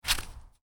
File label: Footstep snow shoe, Shoes: Individual Footstep On Snow, Light; Human; Stuart Duffield